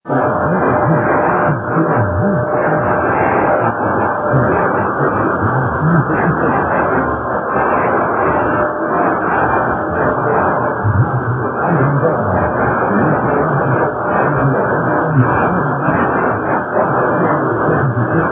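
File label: radio, 4915 kHz; R Anhanguer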